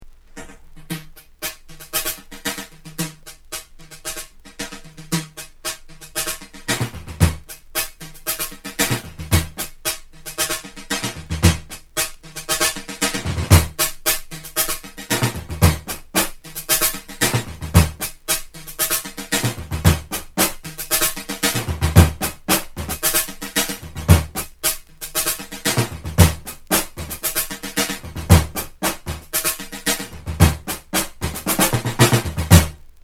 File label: drums